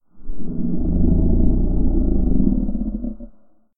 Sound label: tree moan; Brightwood Guardian